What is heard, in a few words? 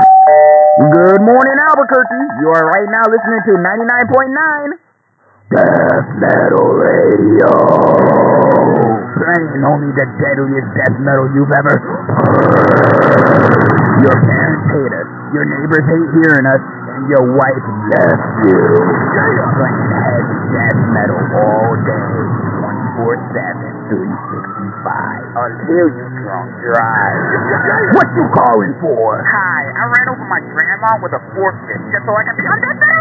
metal radio, ambience